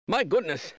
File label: sci fear; Half-Life